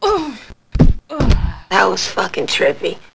death

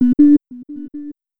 clone

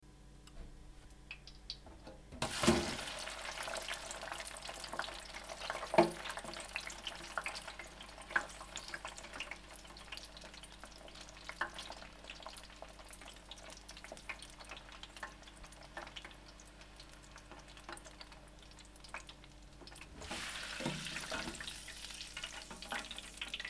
frying fries going in and out of oil

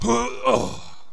death; sarge

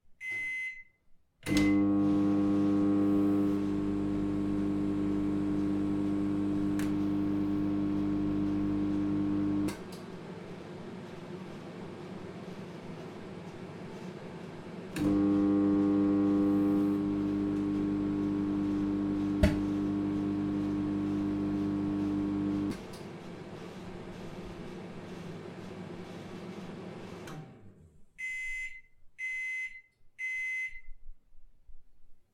microwave oven

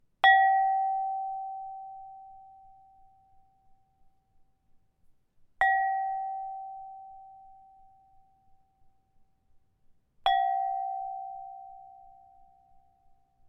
chiming pottery

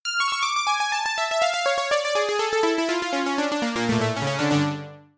synthpiano analog piano; zasfx

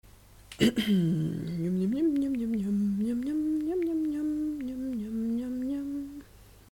mnium mnium mnium

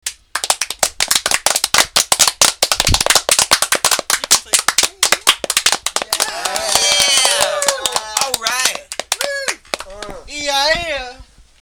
clapping hurray

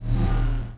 footsteps energy

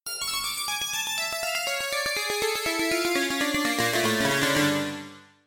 synthpiano synth piano; zasfx

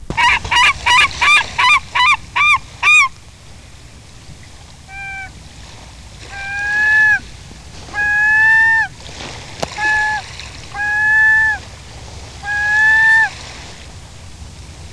herring gull

